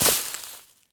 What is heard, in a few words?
wall tree; Golden Crayon Sounds